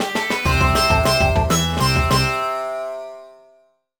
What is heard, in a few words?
Sting; active health with carol vorderman; nds